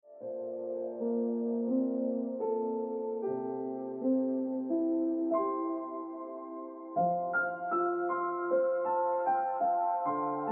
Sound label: Piano Music